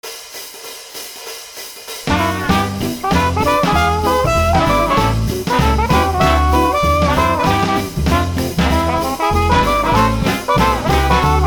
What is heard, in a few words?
Trumpets Jammin'; voodoo vince xbox rip